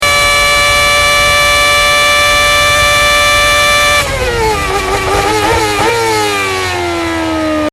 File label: Deceleration, phonetones; Red Bull MOBILE